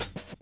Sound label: GRV, Groove; AMG Gota Yashiki Groove Activator